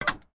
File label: publictelephone close